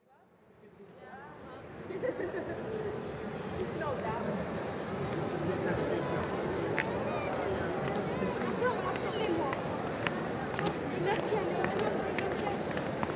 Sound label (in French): Entrée Muséum